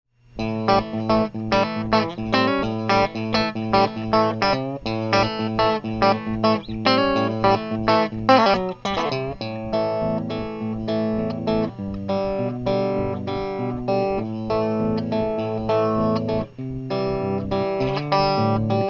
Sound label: jc12-70el country